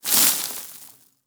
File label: slide start; aragami